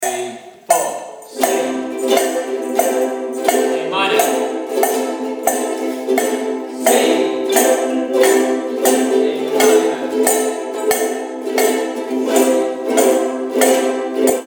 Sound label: ukuleles